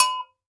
GANKOKWE, African Percussion; E-MU Producer Series Vol. 7 – Old World Instruments

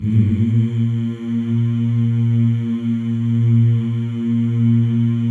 male; Spectrasonics; Symphony of Voices

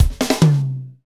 FUNK; Northstar - Drumscapes Roland

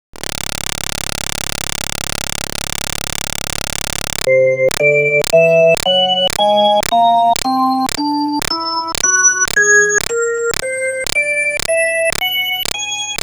B3 Organ; Masterbits CLIMAX 8

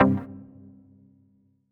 HOUSE, House Dance

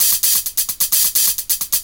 hihat 2, 130, drum loops

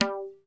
TABULO HI; Northstar; The Wizard VOL-1

